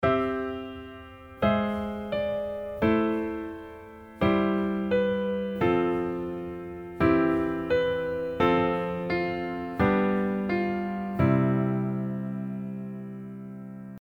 diatonic

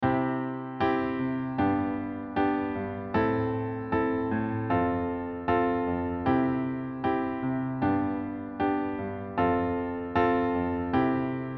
range mid, accompaniment range

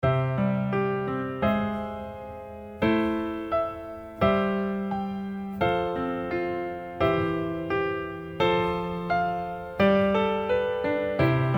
add9 form arrange ALL

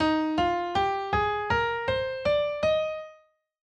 Major Scale Eb

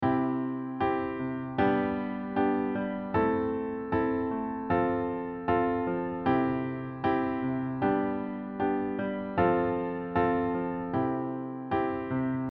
range close, accompaniment

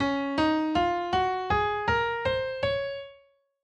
Major Scale Db

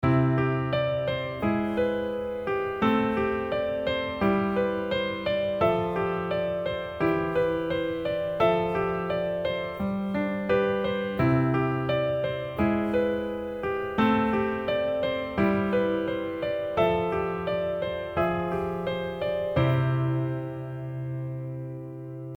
RH voicing, instrumental arrange

